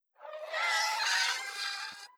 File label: Hydra Vo